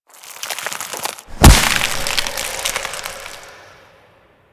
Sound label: Frost Idle Agile Power; Warframe; Warframes